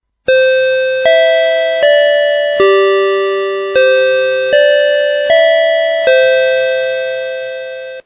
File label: Westminster Abbey; doorbell; siren alarm